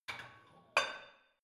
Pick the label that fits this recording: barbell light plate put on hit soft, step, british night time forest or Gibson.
barbell light plate put on hit soft